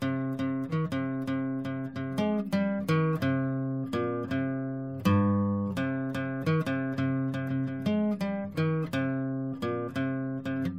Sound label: Classic Jam (C Minor) (Acoustic)